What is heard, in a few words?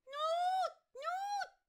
Fan Bearer Attack Vocals